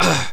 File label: pain; player